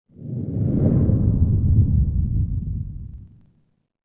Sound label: Supernova Blast; Ambient; Sid Meier's Civilization 4 Complete; Beyond the Sword